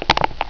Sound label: in cup, dice